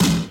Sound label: solid acoustic snare sound a sharp key